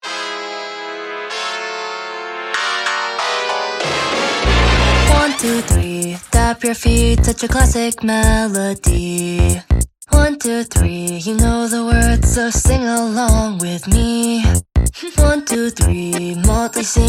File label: Male Cover